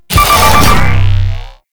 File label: Purification Machine Cleaned A; Infested Survival Purification Machine; Warframe; Nineteeen Ninety Nine